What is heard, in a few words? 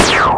Apocalysps Snare, MultiMoog; Drums